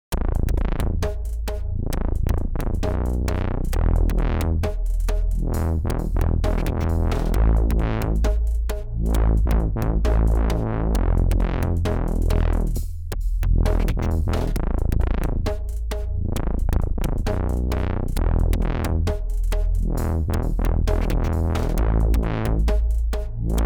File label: Sneaky Saw; Agonizer iOS